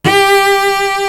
solo cello; East West; Global Sounds

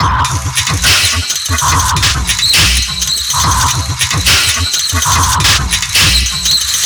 Vinyl Loop; Sonic Foundry (Sony Creative Software); Esoterik Beatz; Vinyl101

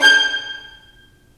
STR VN, Roland, STR Vls Marc; L-CD702; Vlns Marc1-3

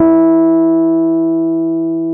Rhodes e3, piano; Giga Samples Collection; Rhodes Mey2000

